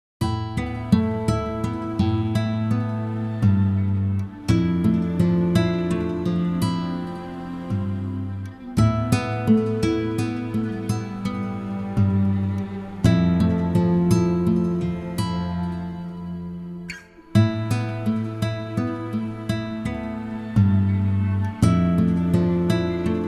gitaro arpe; Muzyka Ilustracyjna

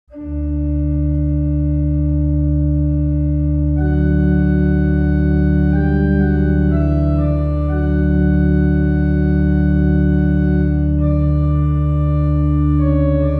organy; Muzyka Ilustracyjna

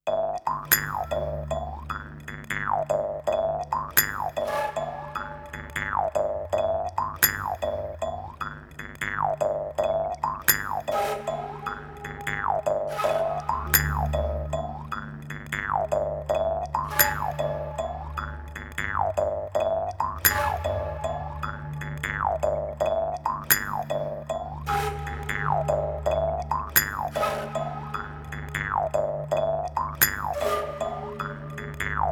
drum; Muzyka Ilustracyjna

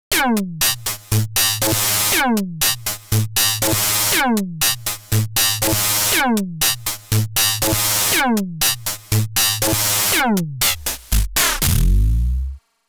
industrial, Pady; Muzyka Ilustracyjna

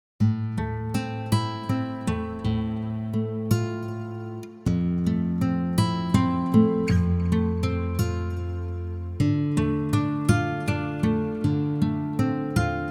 gitaro arpe; Muzyka Ilustracyjna

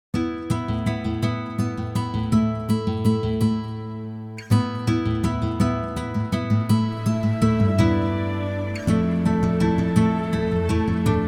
gitaro arpe; Muzyka Ilustracyjna

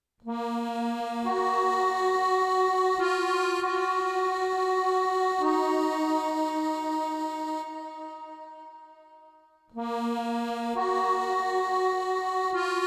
akordeon; Muzyka Ilustracyjna

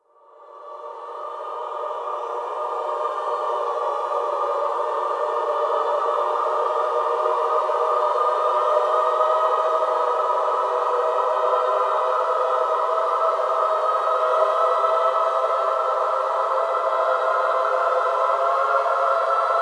chor; Muzyka Ilustracyjna

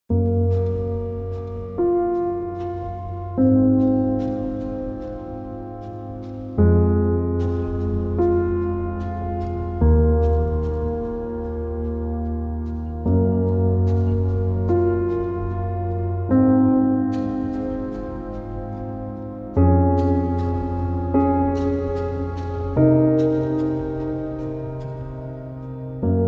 piano strojone; Muzyka Ilustracyjna

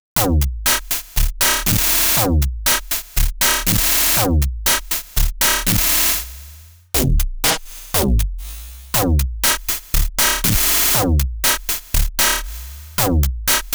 industrial, Pady; Muzyka Ilustracyjna

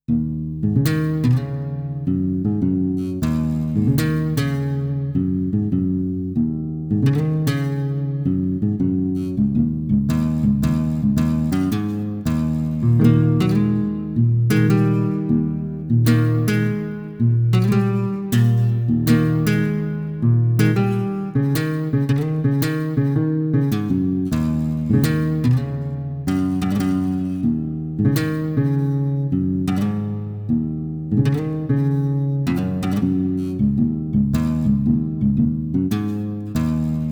gitarowy; Muzyka Ilustracyjna

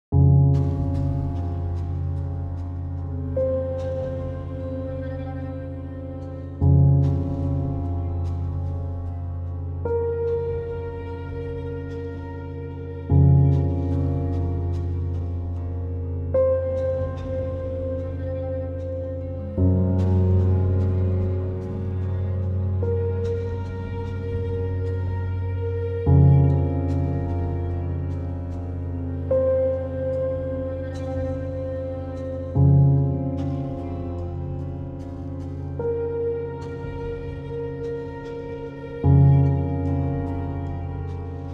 piano strojone; Muzyka Ilustracyjna

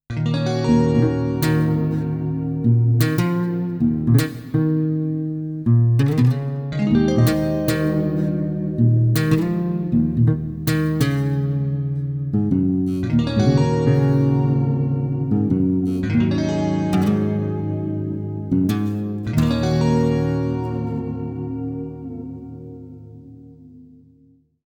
gitarowy; Muzyka Ilustracyjna

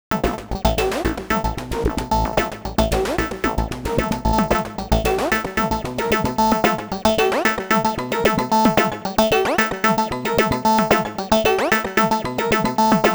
rytm industrial, Pady; Muzyka Ilustracyjna

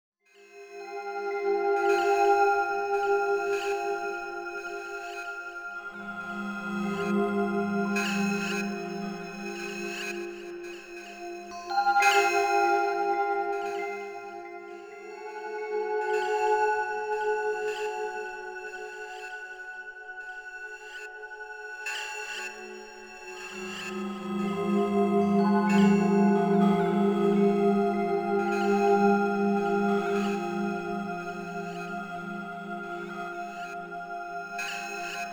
dzwonki; Muzyka Ilustracyjna

